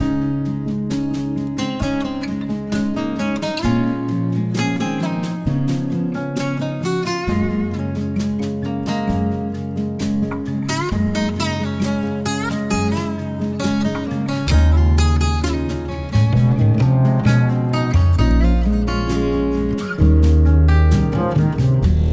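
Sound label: Music for reading, Book sounds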